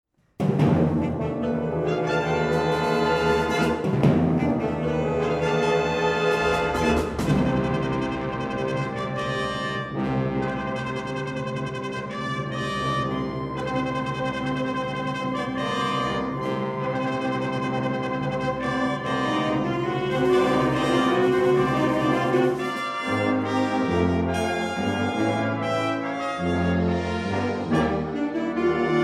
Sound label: Fanfare; Helix HS